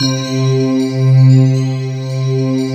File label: C3, Synth; FANTA90S; Big Fish Audio; Synth City; PHANTASK